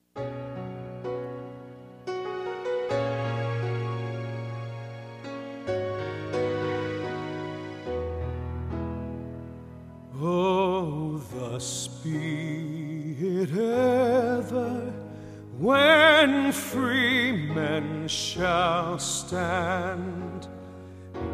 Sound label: National Anthem; 3rd Verse; dedication to america